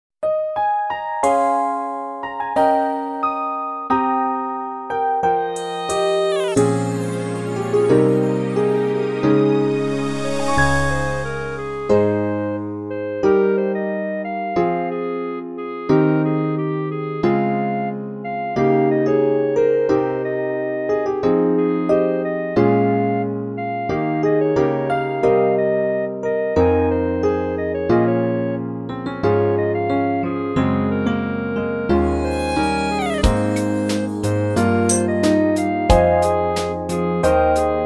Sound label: Piano